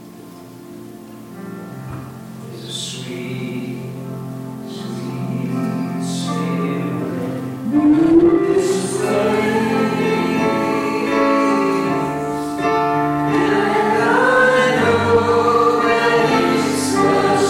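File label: Hymn, church service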